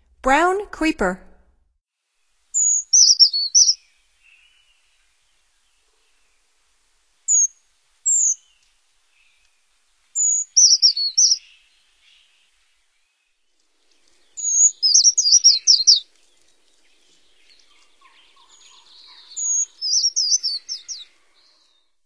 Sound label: Brown Creeper, Songs of Birds of North America; Stokes